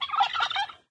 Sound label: Turkey Mom Idle; Farm Mania 2